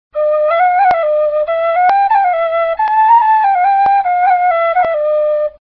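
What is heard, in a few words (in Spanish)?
pito de caña; tradiberia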